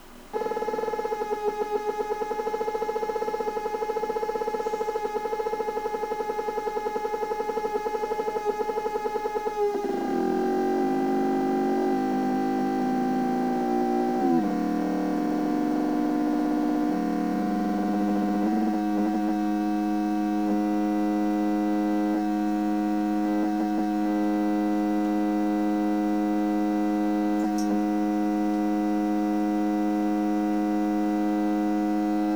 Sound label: Ambience; Ambience for April